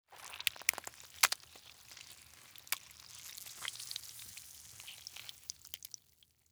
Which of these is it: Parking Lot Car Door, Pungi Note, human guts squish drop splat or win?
human guts squish drop splat